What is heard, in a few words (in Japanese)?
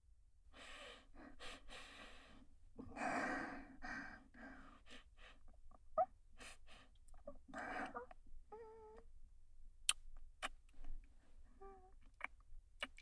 ドスケベねっとりフェラループトラック, ASMR; 萌声